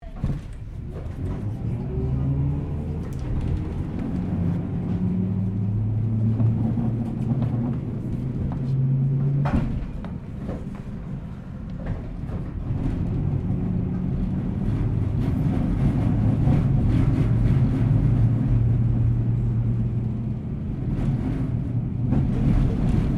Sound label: Tram Melbourne W Class Start Stop Medium; Streetcar; Streetcars; Vehicles